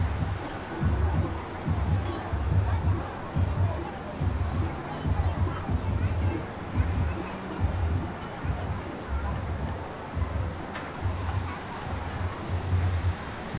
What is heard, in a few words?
amb; vegas night life